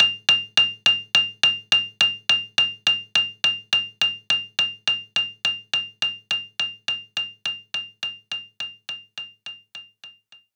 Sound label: Organ Perc C4